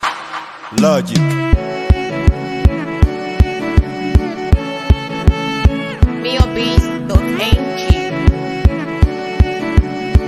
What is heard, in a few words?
Professional Beat Violin Praises